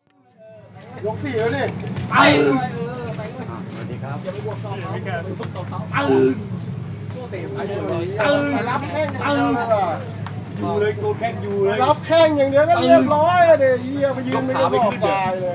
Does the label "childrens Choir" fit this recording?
No